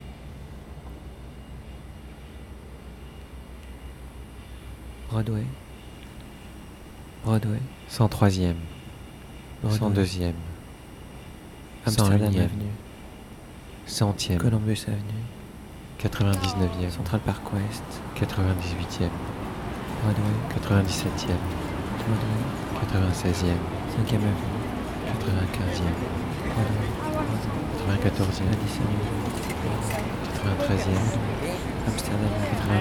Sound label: Noise York